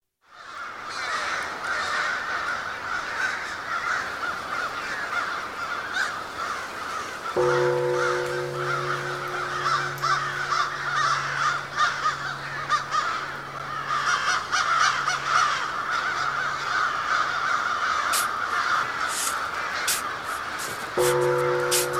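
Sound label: sound of crows presence in the wild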